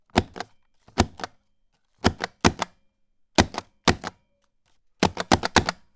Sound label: Rubber Stamps